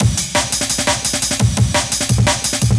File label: Rock, Loops, breaks; M8